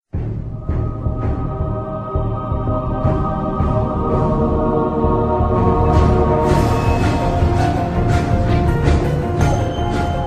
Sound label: cool halloween ringtone